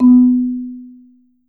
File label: Marimba B2; Sampleheads - Dave Samuels Marimba & Vibes